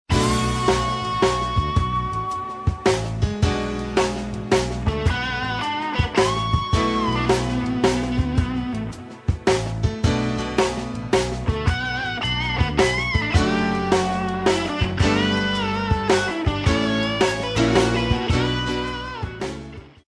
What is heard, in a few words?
blues